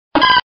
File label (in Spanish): Grito de Chikorita